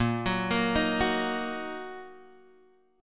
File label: A sharp Suspended 4th Sharp 5th Guitar Half Step Down; A#sus4#5